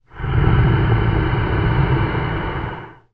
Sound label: horror monster breath or growl